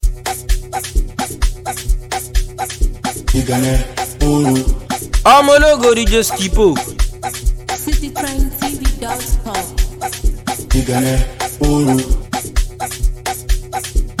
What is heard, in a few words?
Amapiano Beat